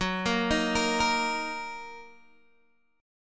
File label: Fsharp 6th down Guitar Open G